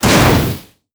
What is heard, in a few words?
Hit Light Volcano Add; netent